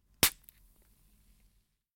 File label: Ahhh